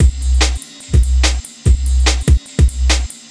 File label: Beat, Futurist Drum & Bass; Drums & Percussion